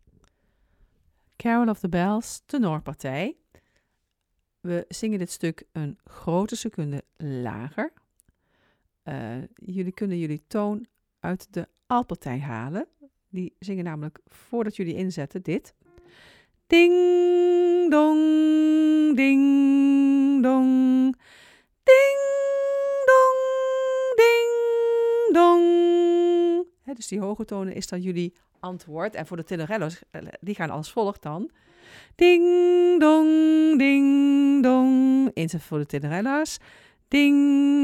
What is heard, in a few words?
Carol of the bells tenor